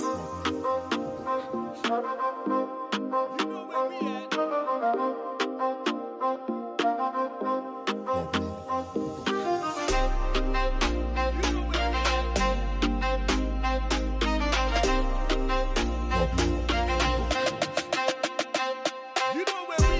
Reggae Dancehall

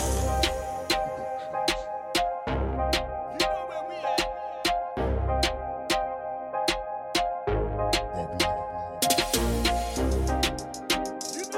Reggae Dancehall

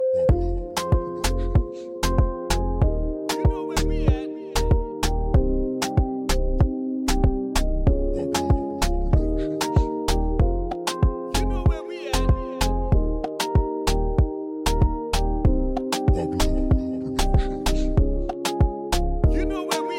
Reggae roots

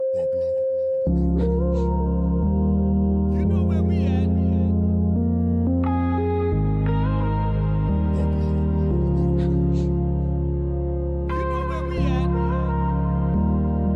POP EDM

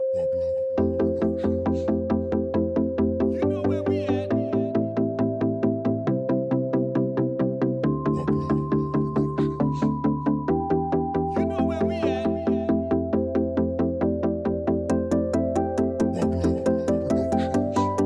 POP EDM

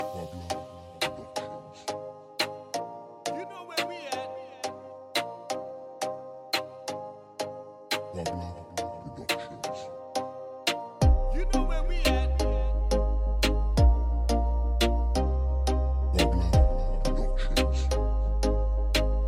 Reggae Dancehall

